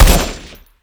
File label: shoot, weapons; rcp120